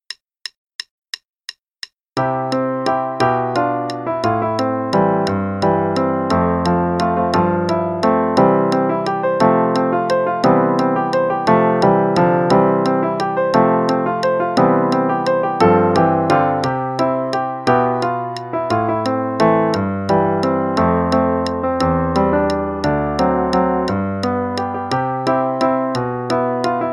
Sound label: Play along, no piano solo; pop and rock music